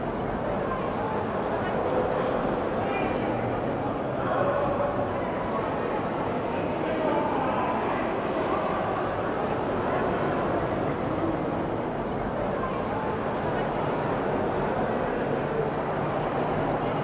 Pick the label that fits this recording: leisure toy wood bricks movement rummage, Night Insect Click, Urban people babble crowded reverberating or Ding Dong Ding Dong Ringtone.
Urban people babble crowded reverberating